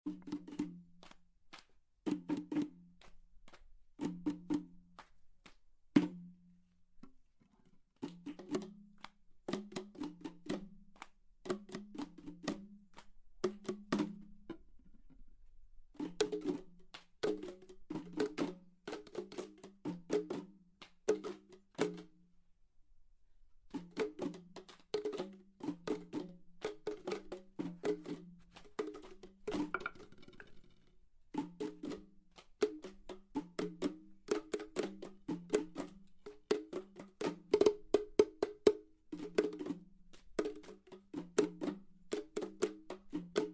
impro-instrumente plastik

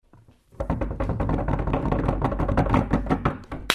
klopfen auf tisch

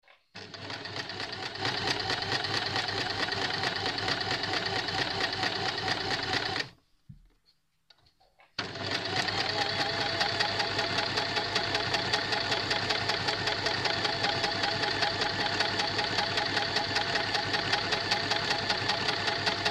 Nähmaschine

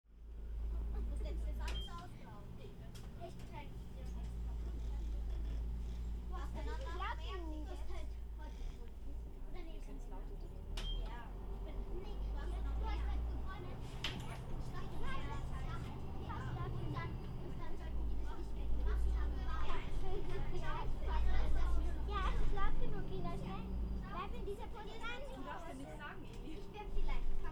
busfahren

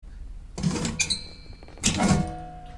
abfall treteimer

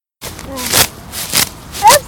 gras ausreissen

